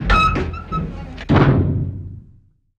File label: Hatch Large Metal Open; Doors